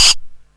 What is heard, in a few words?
SYNTH HAT; Best Service Alpha Dance vol.2